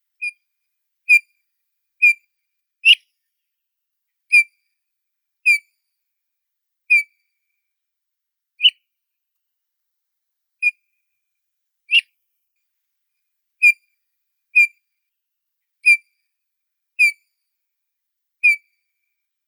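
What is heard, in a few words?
grey bullfinch Pyrrhula cineracea, nature sounds; snegir serii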